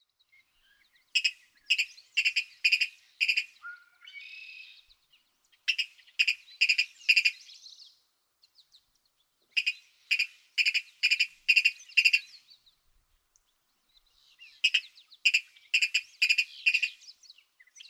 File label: Virginia Rail